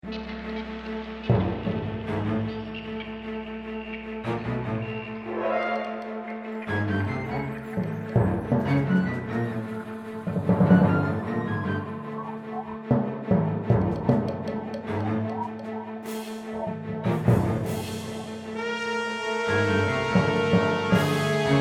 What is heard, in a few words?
thriller horror; Jamie Coleman, Film Composer